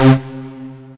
classic rave stab; Punches Hits Discoblasts; single hits

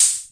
hho, Hammond Drum Machine; DRUM MACHINES

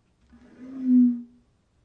conga rub hit; Western and Latin Percussion; single hits

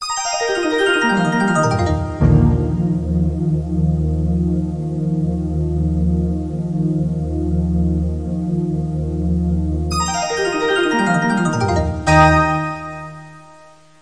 computers are awesome, Vaporwave; Instant Vaporwave 80's Party

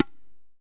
tin, Tabla Kit Small; ETHNIC and WORLD PERCUSSION

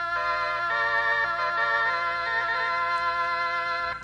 almost organ; KEYS ORGAN PIANO

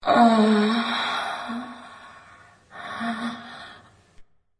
sexy female gasp

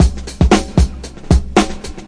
rockin bopfunk, 115 bpm, drum loops and breaks

